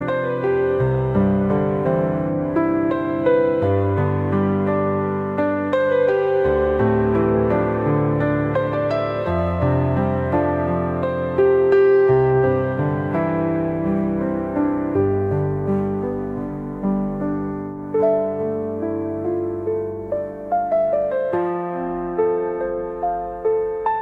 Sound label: Somewhat Sad